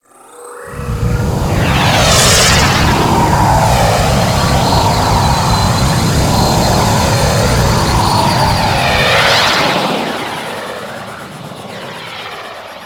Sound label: Hub Undocking; Warframe; Ambience; Liset